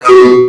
Male Hurt Robot; gamerip; Mars Sortie

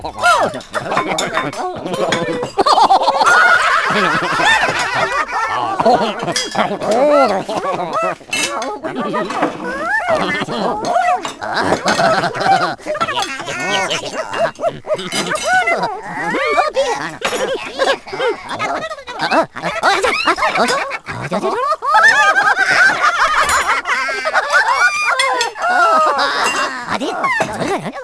Amb Dinner party; Fur Fighters

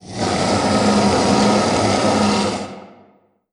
Scream; Mawlek

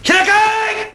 Japanese Shout; gamerip; Mars Sortie

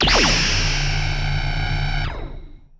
Large Sustained Beam Evil

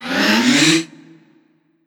Satyr Flute Soufle

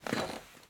Sofa Sit; Sittable

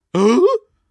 Maggot Startle

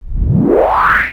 Ion Cannon Powerup

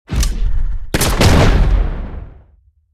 Buster Shot

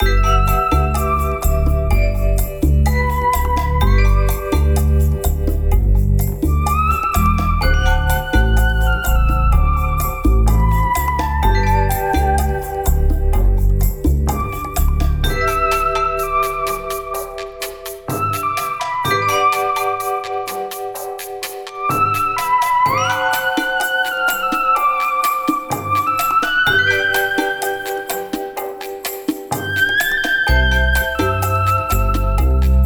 Tavern; blippo

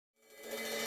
Battle

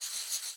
Sketch Env Write; Maze

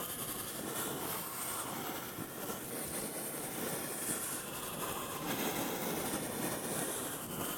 Blackboard Write On Loop Rev; viewfinder

